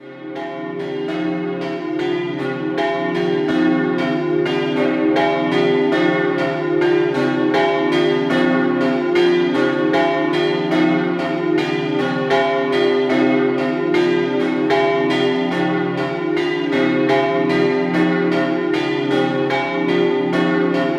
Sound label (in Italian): Doppio, campane; Martignacco